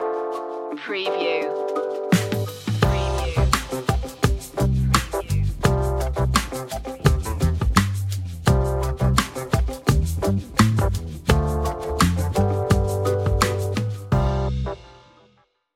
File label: easy hip hop